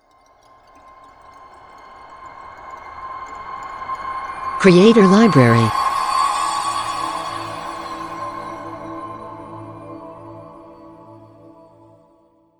Ice Logo; Logo-Idents

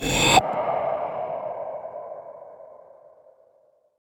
Short Breathe

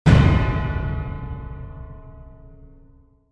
Trailer Hit

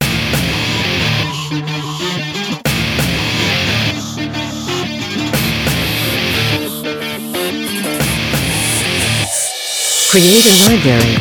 Rock

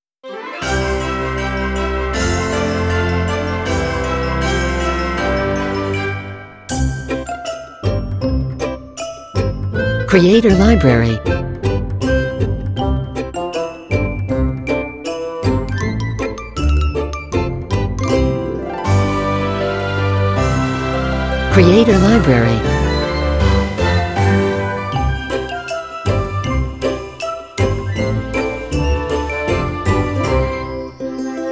Playful Christmas Orchestra

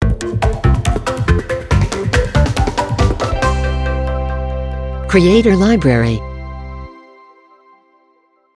Good Result logo; Logo-Idents

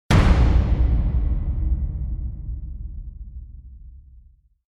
Trailer Hit

